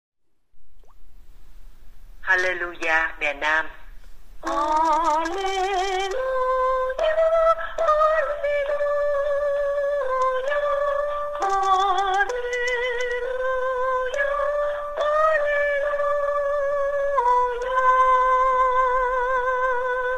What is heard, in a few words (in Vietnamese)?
Alleluja nam; ca doan teresa